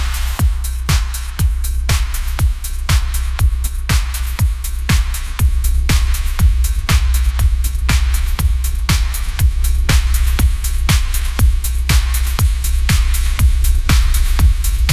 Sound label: upbeat pop melody, ringtones